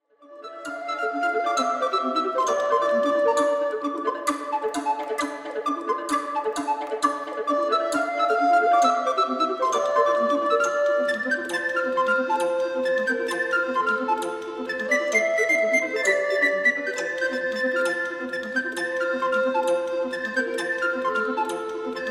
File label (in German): dreiklang